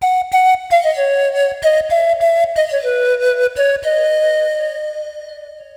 flute, ethno; eJay

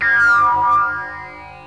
wierd sound, Synth

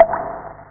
DRIP; Hottest 5 (1995)(PDSoft); DoctorStrange

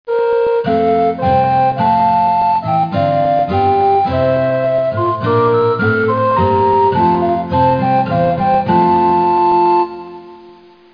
Anthem

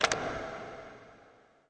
ARROW BTN, BUTTONS; PaxImp; SPECIES

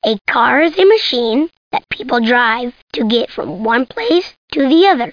car

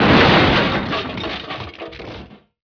fall; BESTSHOT; noisybit

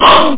GROWL; drwho

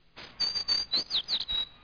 bird; DAoC; Toa

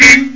car horn honk

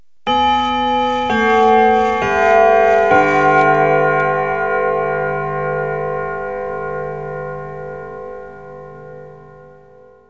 Westminster 1⁄4 Past, Chimes; KABOOM! - Wild and Wacky Sound Software; Household

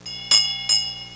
ship bell

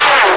PLANE ZOOM

